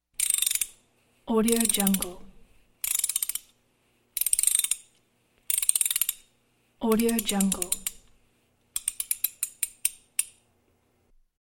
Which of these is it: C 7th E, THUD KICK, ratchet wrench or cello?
ratchet wrench